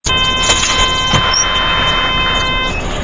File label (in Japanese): basu; 着信音やアラーム（目覚まし）音に使える、着モーションとして; FOMA